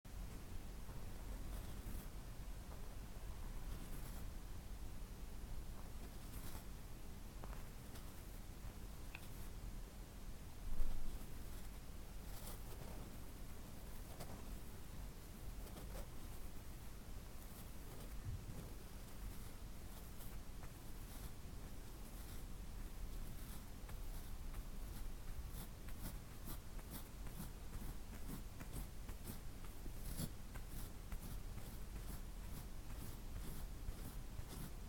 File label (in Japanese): 左耳 軽く耳かき, ASMR